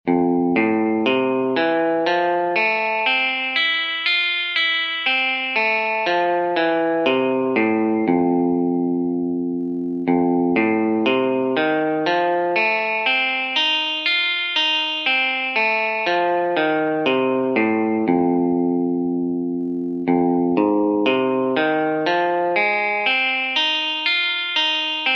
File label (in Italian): Arpeggi in Fa